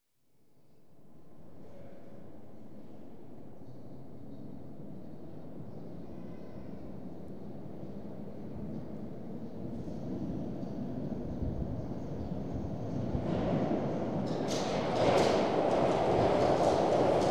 Underground Railroad, London; Transportation; BBC Sound Effects Library

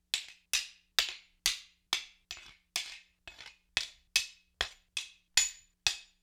Axe Sabre Blade on Blade, Weapon on Weapon; StillNorthMedia

